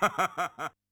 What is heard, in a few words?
LAUGH; Yamaha DD-65